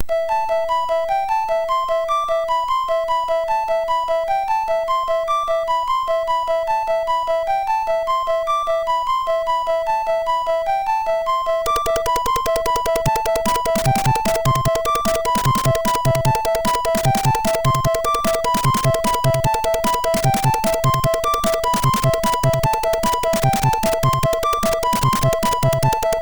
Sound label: Famous Bells, MOS6581R3